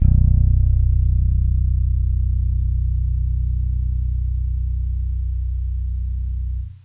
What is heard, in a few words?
bass